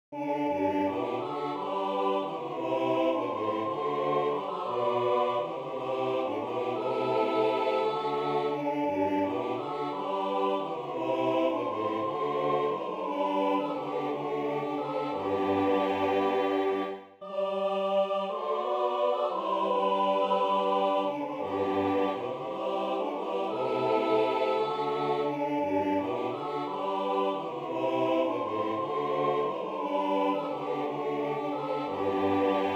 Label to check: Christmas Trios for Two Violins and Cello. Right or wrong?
Wrong